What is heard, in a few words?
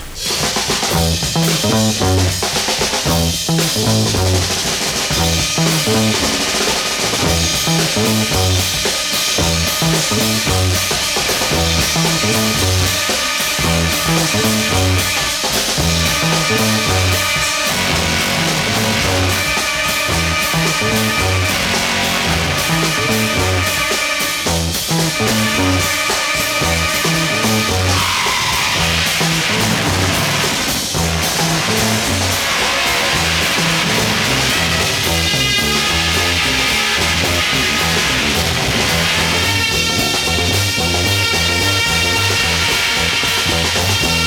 Two Drums, Bass, Organ